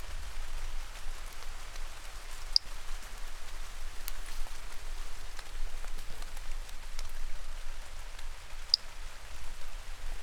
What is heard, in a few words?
Brachycephalus tridactylus; MR Bornschein